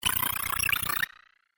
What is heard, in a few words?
Robot Power Up, sci-fi robot sound effect; Robot Sound Effects; Andrew Fleming